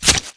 Knife Scale, Damage

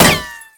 Gun Metal, Damage

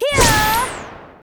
Knights attack

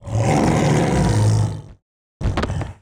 Infected beast die

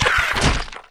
STINKBUG WAR DIE

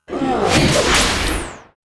T Destroyer attack

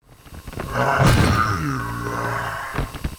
STONEBLOCK WAR IDLE

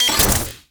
damage; Mob

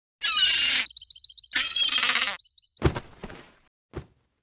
BRUTAL DIE

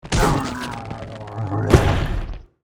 BLAZE BLOCK WAR DIE

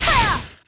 Summoner attack

